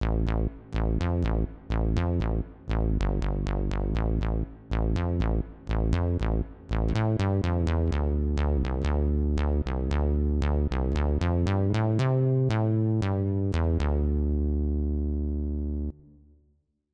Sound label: Fishman TriplePlay test electro bass